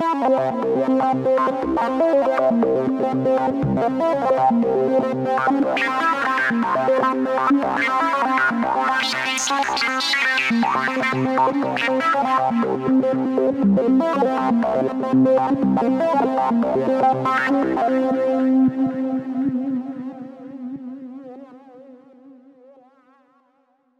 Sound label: melodic enchantment